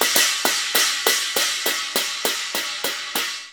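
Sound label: CHINA LG6 R, China cymbal; Spectrasonics; Heart of Asia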